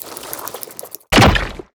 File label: spiders bossfight spit